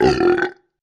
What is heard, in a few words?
Belch Deep